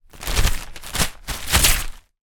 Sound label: foley paper wrapping paper remove from roll hard